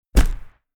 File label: open dialog